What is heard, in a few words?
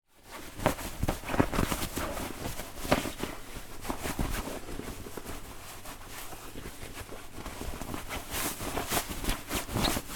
household pillow case put on pillow